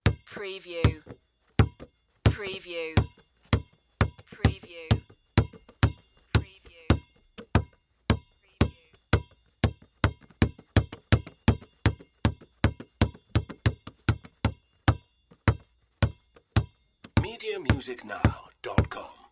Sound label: Basketball Dribble; sound effects bible